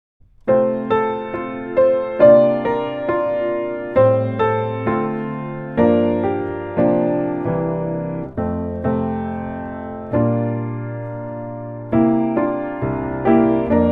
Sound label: A Lullaby piano